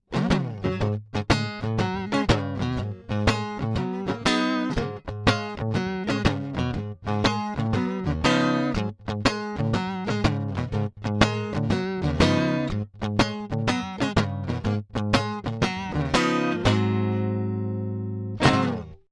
Vibroverb + Vibratone; fender

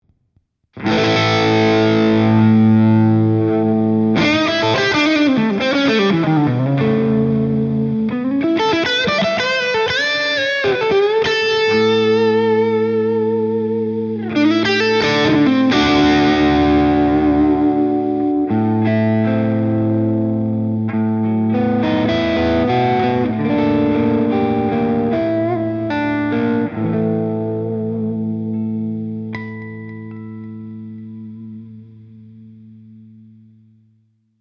Dyna Crunch; AmpliTube

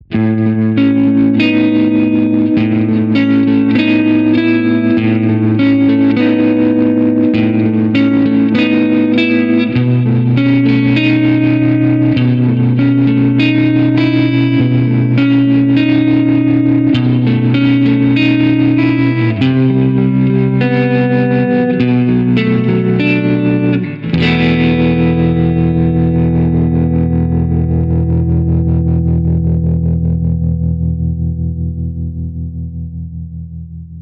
65 Super Reverb Tremverb; Fender

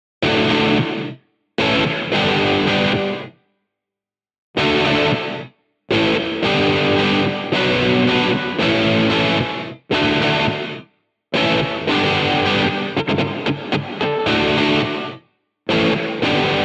SPACE GATE; xgear

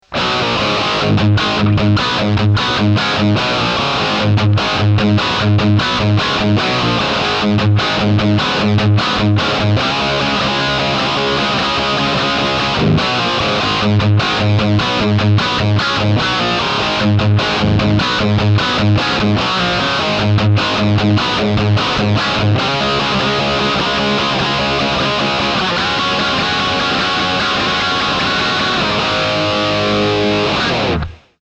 Rock, MultiFX; iRig UA

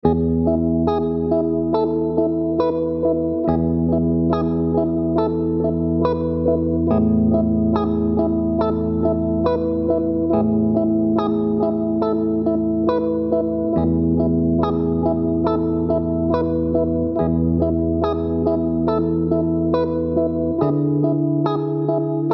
X-VIBE STEP FILTER; xgear

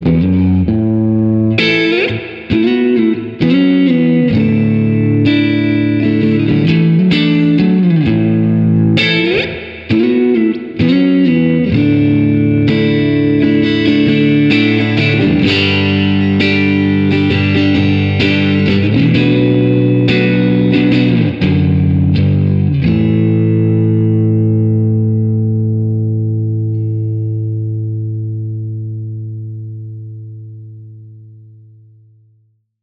Fender 65 Super Reverb Drenched Twang; 真空管アンプ

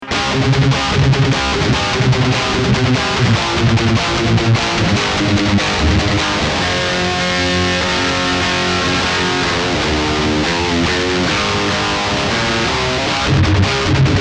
High Gain, MultiFX; iRig UA

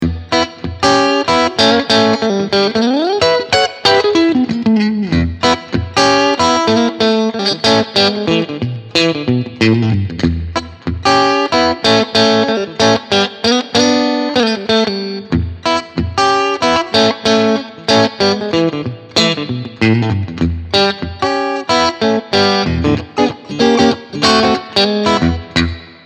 Clean Vibro King; fender

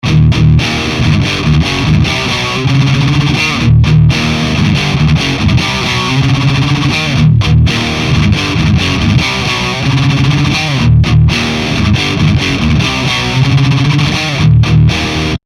MH 500 Speed Rhythm; fender

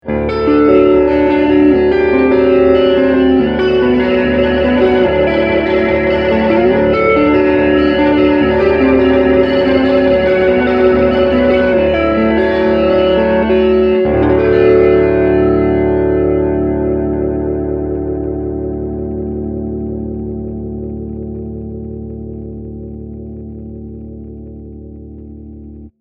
Vibratone Slow Fast; fender

